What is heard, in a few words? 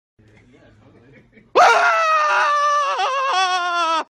Scream; Robert Downey Jr